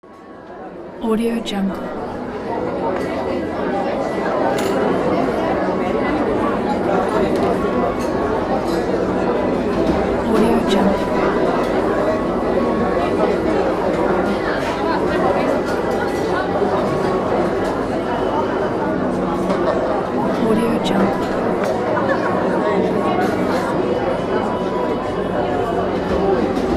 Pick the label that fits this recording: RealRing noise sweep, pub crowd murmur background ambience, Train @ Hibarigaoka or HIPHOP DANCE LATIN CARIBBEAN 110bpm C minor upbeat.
pub crowd murmur background ambience